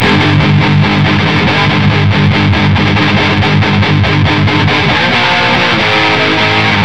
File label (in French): Guitare